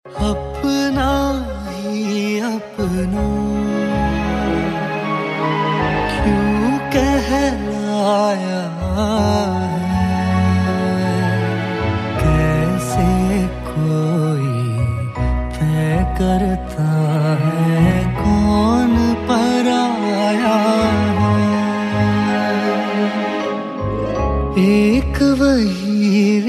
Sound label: male version, bollywood ringtones; brothers